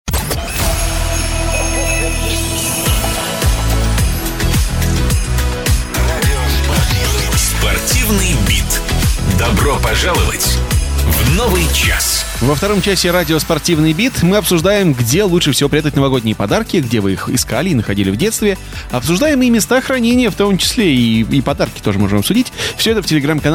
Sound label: Sportivniy Bit; iLikeRadio